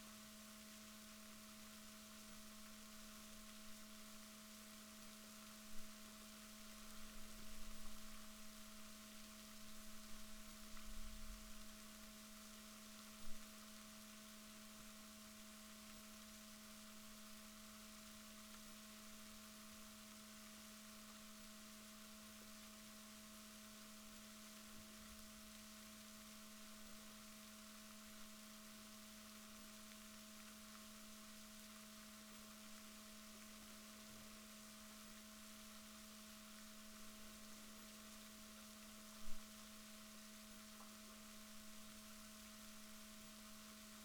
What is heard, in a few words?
rorquals negatif, Balaenoptera Physalus Chile